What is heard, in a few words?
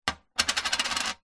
money coin set down on metal surface spin